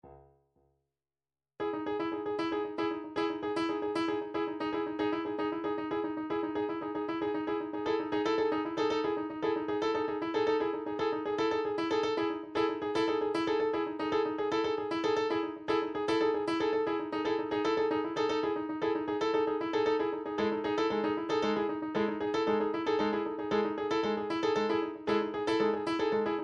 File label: drum trax per